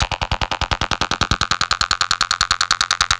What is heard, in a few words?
Vocobeat; Sonic Foundry (Sony Creative Software)